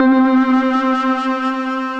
Synthesizer Middle C Notes, Lead; multisampled; synth keys

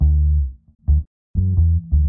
bass

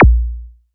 blip

